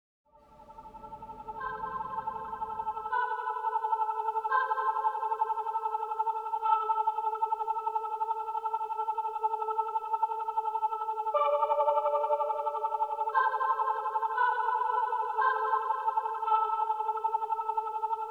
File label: Spooky Clown